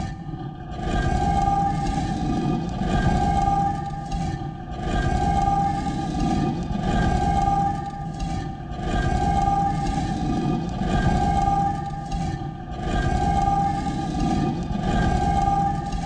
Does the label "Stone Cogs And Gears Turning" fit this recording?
Yes